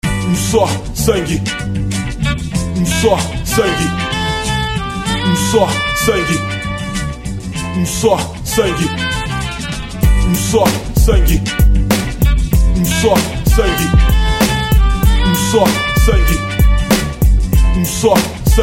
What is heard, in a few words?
beat